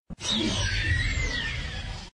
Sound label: Velociraptor